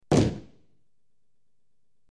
Gavel Impacts Dull

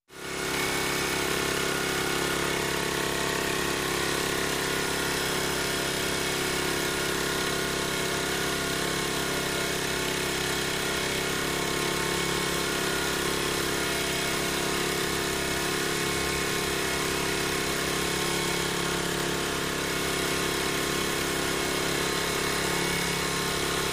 Edge Trimmer Steady

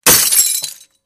Glass Break Single Gl; windows & mirrors

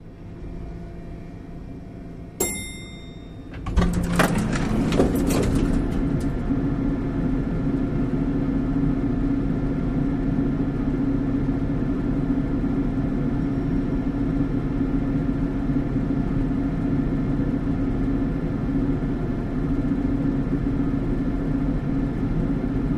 Elevator Bell Ding

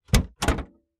SUV hood release; trunks & hoods

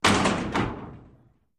Elevator Gates Doors

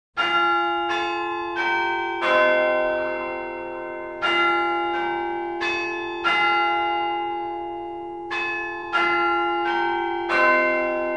School Bell, bell towers; St Pauls

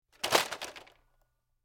RC Car Toy Car Drops On

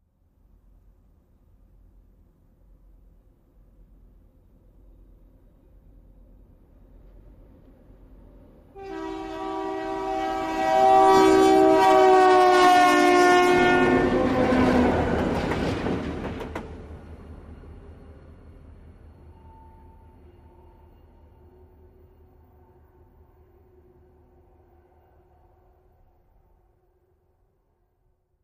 Train Horn Doppler

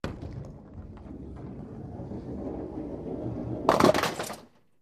Bowling Spare

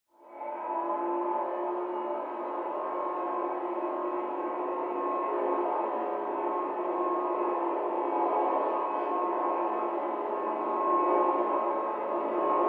Vacuum; Various Movements, From Down Hallway.; Fans

